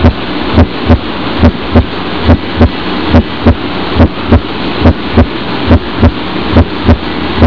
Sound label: Heart Wide Split S1